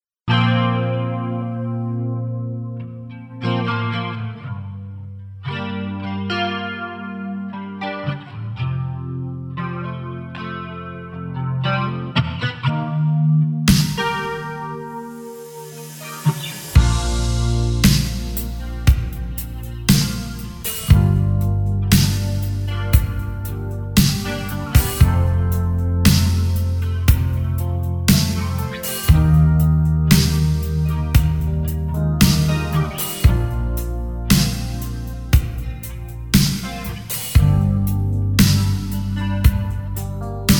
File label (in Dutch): instrumentaal